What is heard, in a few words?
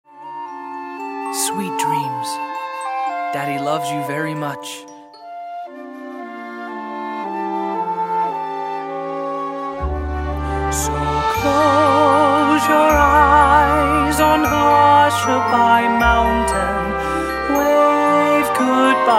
Audition Vocals